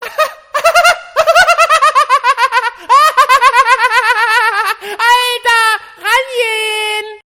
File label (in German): kiffer lachanfall